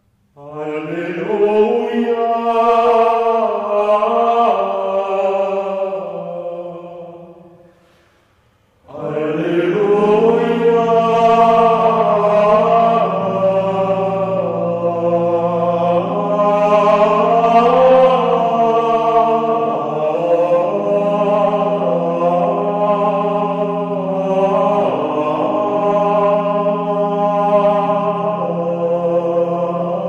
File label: Alleluia SOLO; Ene 06 Epifania; Vidimus stellam